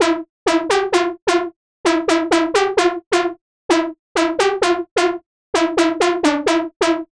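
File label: lead supa saw; fabrik core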